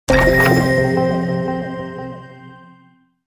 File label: Chest open reward